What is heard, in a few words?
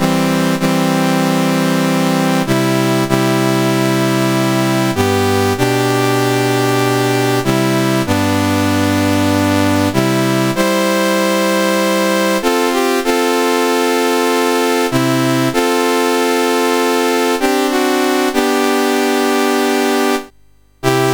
Taiwan China, National Anthems, CSG8580R5; Dave Marquis